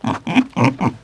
PIG; animal fun

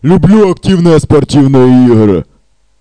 sniff; REDNECK; RAMPAGER

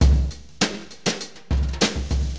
Acid Jazz Funk, Drums; LiveAct Pool 1

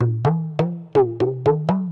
PERC LOOP ETHNO 125; eJay

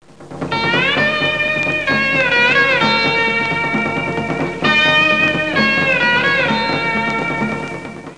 dance; My Science Fair

